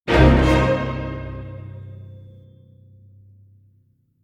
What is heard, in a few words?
Orchestral Hit